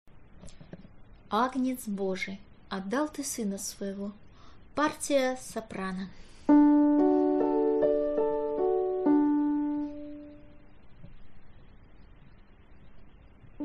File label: soprano; Agnec Bozhij